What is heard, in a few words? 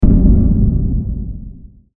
Hit Impact, Hit Impact Distortion Filter; Sound Effects:Sound Design; Blastwave